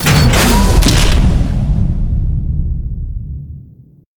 Machine Off; helltaker; Boss

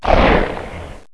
attack boss; Repatitis4

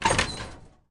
Door Open

